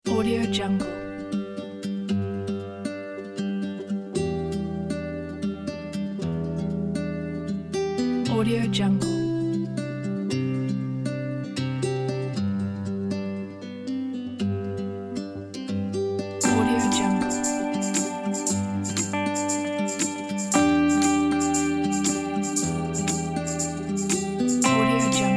animal planet ethnic cinematic

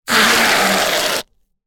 shaving foam

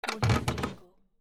floor creak

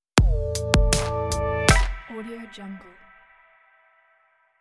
short drum logo; jablonczay

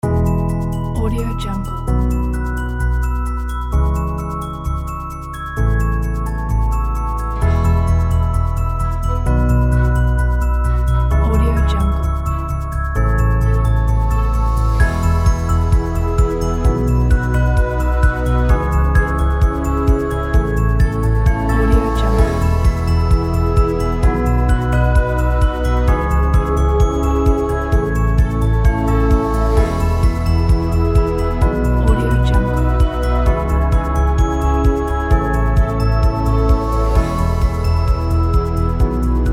christmas corporate

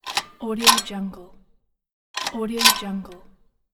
fence door open

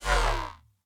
ship set cancel; soundmorph

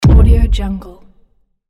impact thud

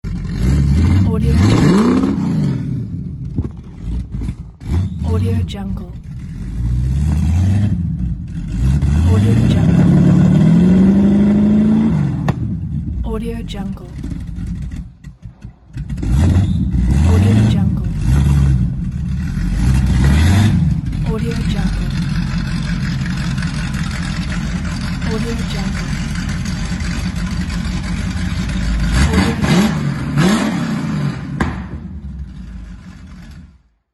ford mustang engine

